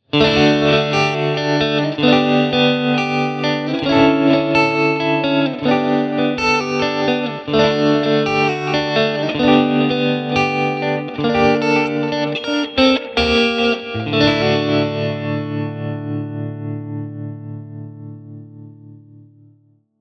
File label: Vintage Clean